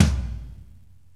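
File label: processed tom, drums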